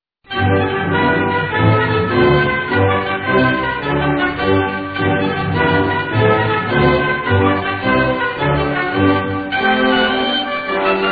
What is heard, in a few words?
interval signal; bbcws lillibolero